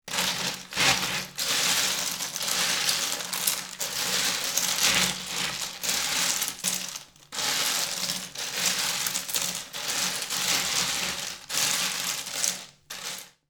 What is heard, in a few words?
ice cubes; drinks